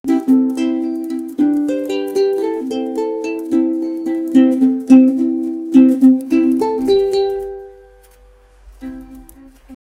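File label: cheerful and playful background, park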